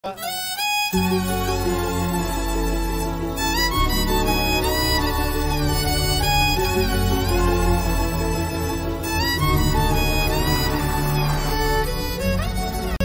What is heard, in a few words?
song bgm violin; Sita Ramam